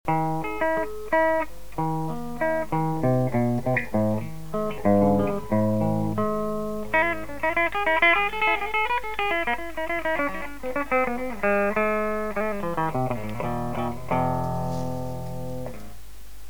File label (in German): gefiedel sauber; elektro